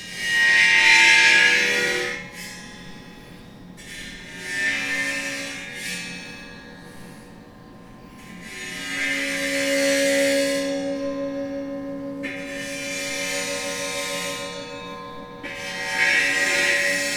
bowed cymbals; metallic

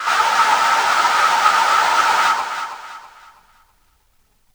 sqr noise

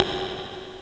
tick, drums

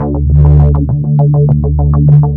bass, korg

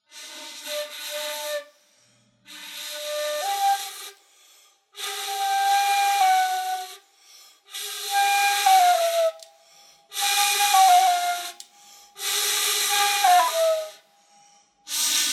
flute

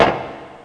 can, drums

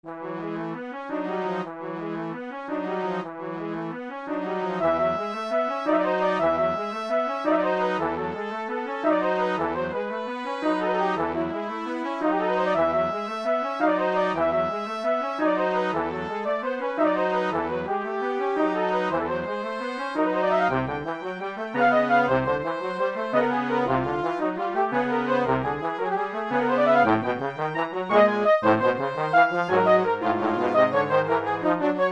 brass

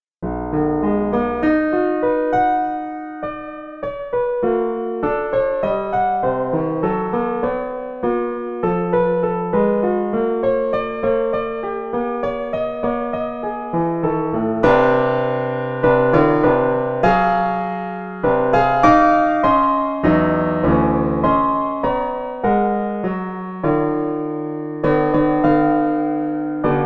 Prelude in 88 Cent Tuning; micro; nonoctave

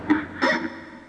noise

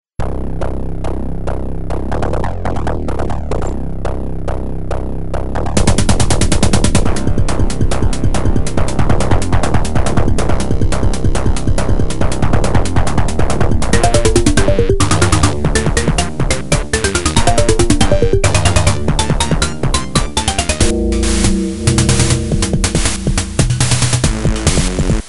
elec rock

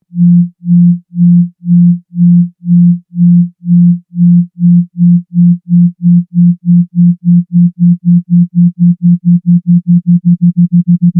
amp mod

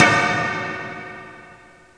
can, drums

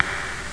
tock, drums

